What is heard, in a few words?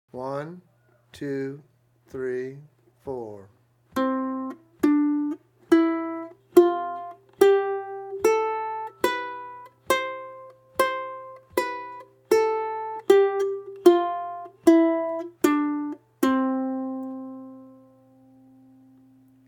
C Scale